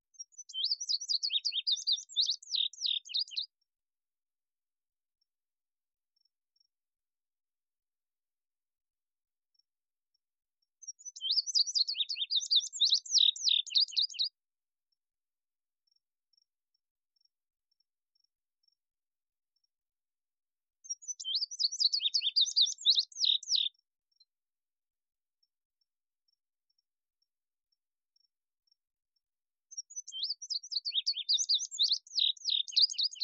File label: Indigo Bunting; Ontario Nature